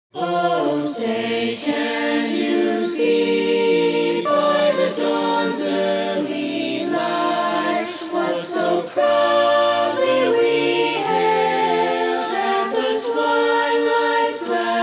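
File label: Learning Track Soprano 2; Star Spangled Banner 2020; Soprano 1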